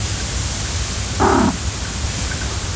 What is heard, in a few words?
Meow